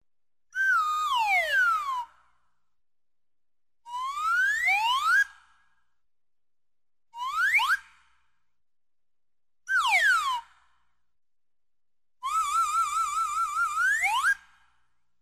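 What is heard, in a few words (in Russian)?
мультяшный свист "вверх-вниз"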